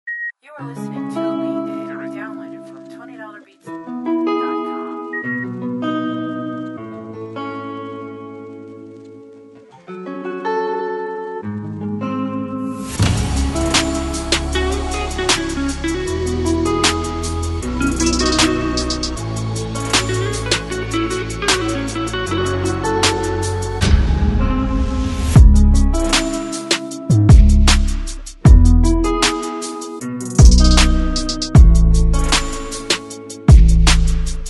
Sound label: Rainz, Guitar beats